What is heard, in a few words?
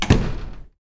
door close